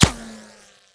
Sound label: dirt, hit; tacz-tweak-pack; taczmechanics